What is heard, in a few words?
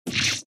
Cut